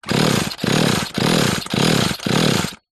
industrial petrol powered power tool false start x5